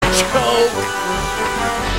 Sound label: choke